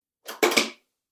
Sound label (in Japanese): 玄関のドアロックを閉める音